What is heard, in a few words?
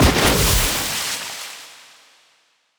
Enemy Evolution Active Shoot; gamerip